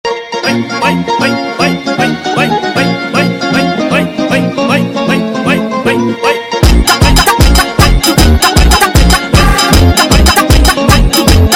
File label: Funk sped Up